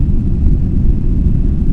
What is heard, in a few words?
tie bay, ambience; doomgiver